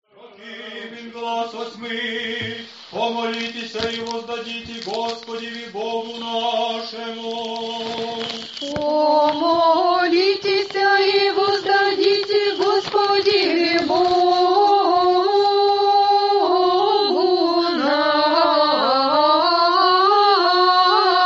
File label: prokimen glas 8